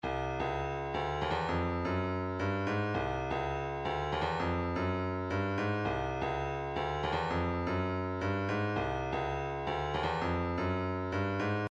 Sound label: 165 kick